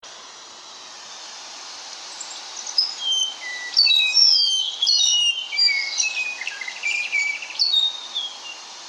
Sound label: yellohead, Mohoua ochrocephala; GLENORCHY